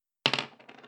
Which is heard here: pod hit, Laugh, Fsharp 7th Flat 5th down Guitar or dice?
dice